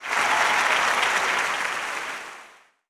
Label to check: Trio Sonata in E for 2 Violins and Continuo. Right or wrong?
Wrong